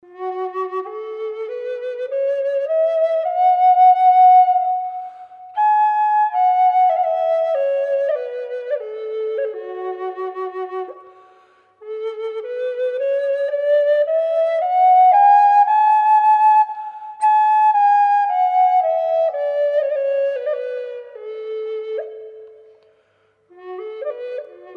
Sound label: black and white ebony f sharp reverb, flutes